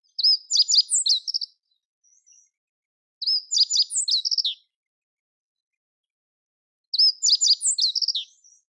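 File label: meadow bunting song, birds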